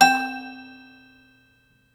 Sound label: soft C4 109; Ableton Foundation FreePacks; Foundation Glitch Toys & LoFi